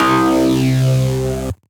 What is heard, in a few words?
Bass; Ableton; Factory Packs; Build and Drop